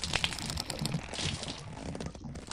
Tongue Pull; lethal company

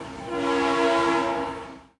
Train Passing Idle; Subway Surfers City Sound Effects; Android

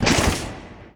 Enemy Hat Appear

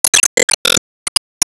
Enemy Bigstamper Fly; disney star smash android ios mobile gamerip 2020

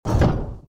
Door Heavy Close

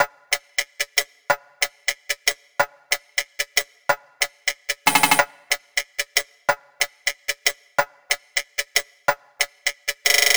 Break 185BPM

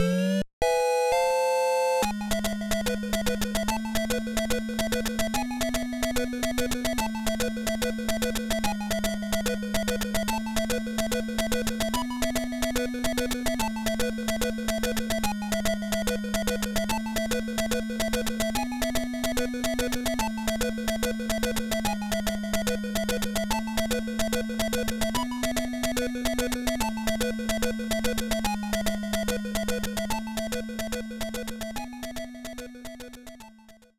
Stage Clear; Dr. Mario; Multiboot; GBA

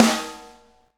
BGM DRUM; the idolmster one for all; PS3

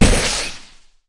Jetpack Thrust Start; Lethal Company

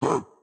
Chop Attack; Caffiend Caelan; Going Under (Switch) (gamerip) (2020)

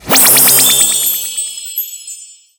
Magic; Skylander

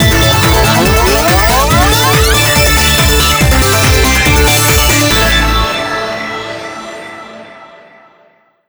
tune win; Tippin Bloks; TUNE Bloxx Win

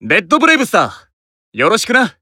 Emo Warcry; Disney Star Smash; Android, iOS, Mobile

